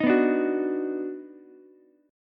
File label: Eb Minor Major 7th Sharp 5th, down, Ukulele; strummed; chord; JGuitar